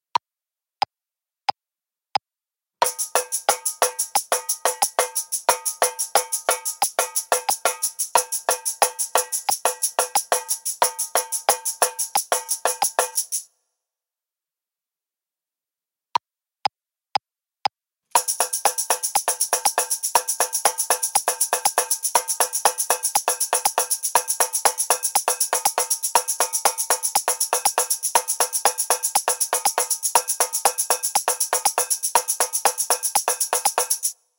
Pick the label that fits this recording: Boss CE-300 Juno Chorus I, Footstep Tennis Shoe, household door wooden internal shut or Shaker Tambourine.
Shaker Tambourine